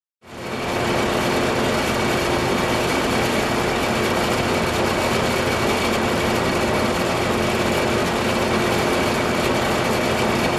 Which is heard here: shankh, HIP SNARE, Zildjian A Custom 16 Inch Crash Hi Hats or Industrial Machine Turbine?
Industrial Machine Turbine